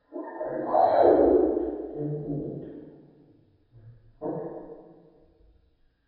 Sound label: bird budgie song; ambience; dfcaverns